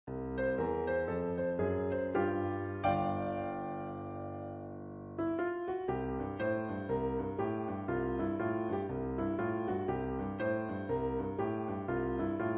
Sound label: Prayer Boogie